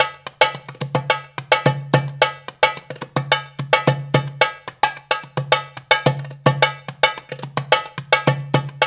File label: Dara Dance, Darbuka; Spectrasonics - Supreme Beats - World Dance